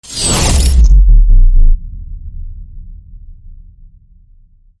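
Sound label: Hit Swoosh Sudden Stop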